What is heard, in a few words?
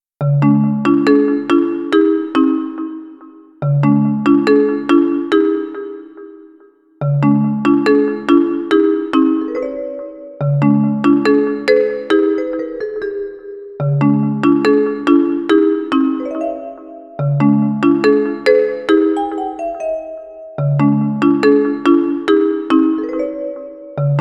MARIMBA, Comic Slow